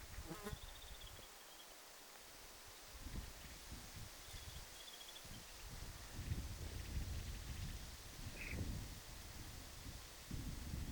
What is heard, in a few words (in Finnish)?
tikli aantelya; vahan samanlaista aantelya kuin pulmusella lennossa; onkohan tuo tikli